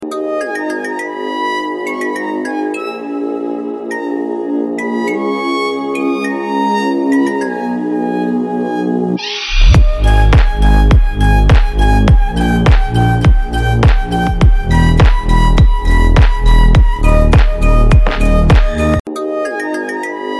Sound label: dark lullaby